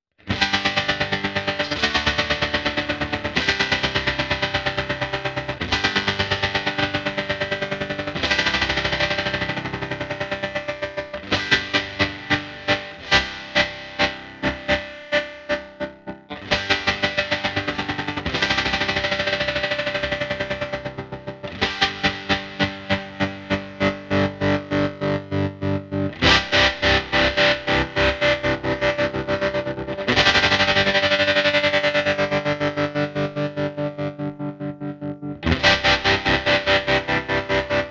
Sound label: Tap-A-Whirl And Screamer; cusack music